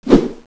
katana swish

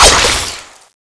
magic knife magic hit, weapons

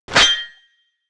whip sword stab skill end

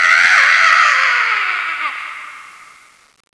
zombie death; boosterzombie

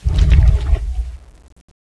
laser end; CSO KrakenBoss

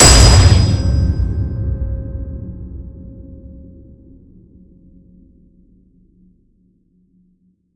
hammer pin; knifeze

